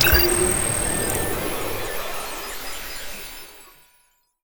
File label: Beam